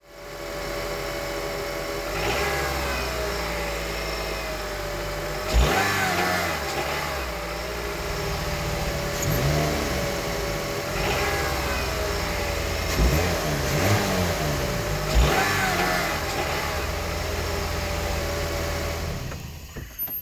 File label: Multiple revs